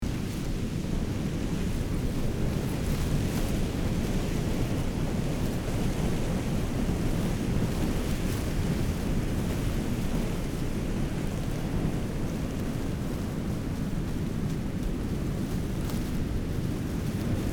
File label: Rocky desert cliff atmosphere